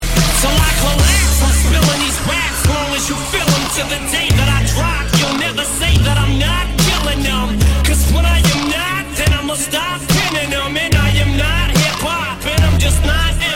dubstep remix

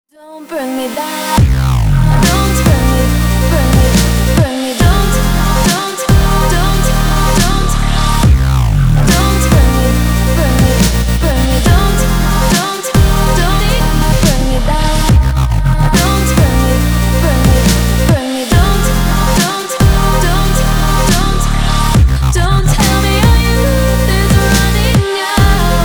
dubstep remix

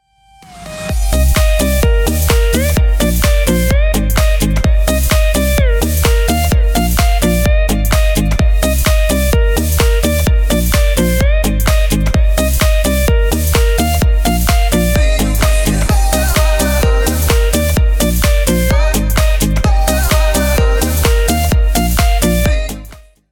club bass

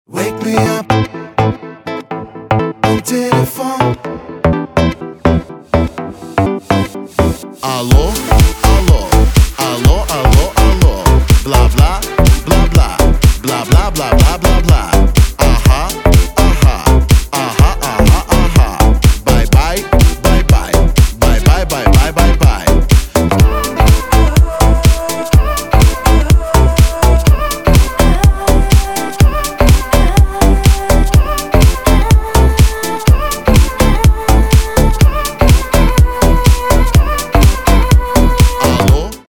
ciao telephone

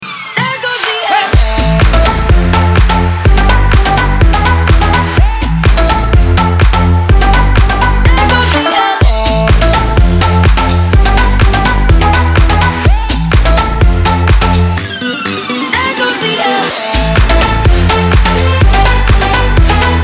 alarm remix

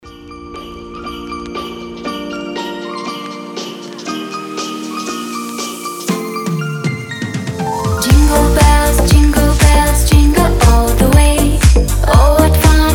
jingle bells deep house

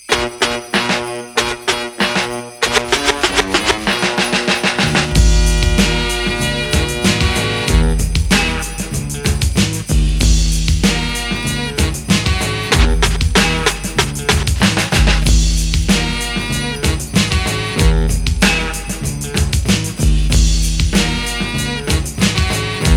christmas rap bells

